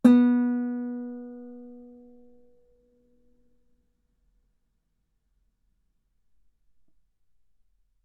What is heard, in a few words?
Guitar B3; ff; sul B